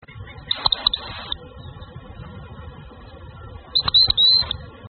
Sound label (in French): Chevalier Guignette; Scolopacides; Le Chevalier Guignette; Oisellerie Galan